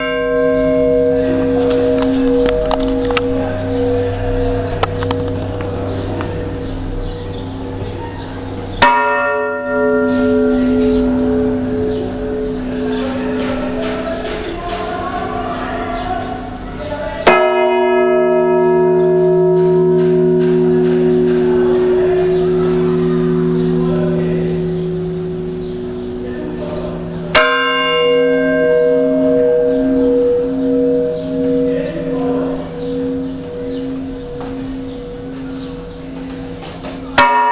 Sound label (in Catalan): campana